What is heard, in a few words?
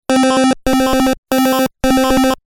square